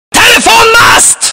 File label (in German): telefonmast high